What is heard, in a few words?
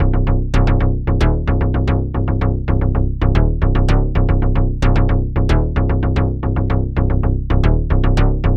Synth Bass